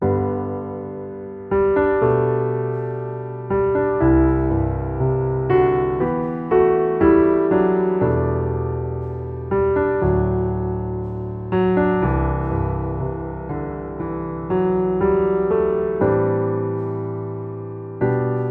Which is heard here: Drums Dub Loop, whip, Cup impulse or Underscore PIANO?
Underscore PIANO